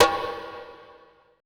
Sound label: ETH DRUM